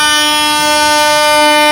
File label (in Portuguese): buzina